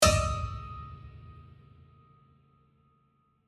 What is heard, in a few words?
Haunted piano string hit horror